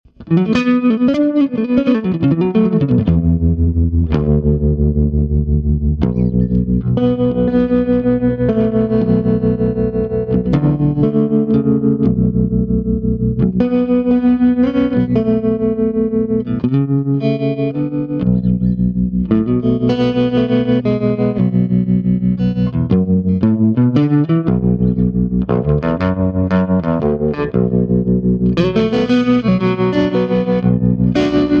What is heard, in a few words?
Swart Amp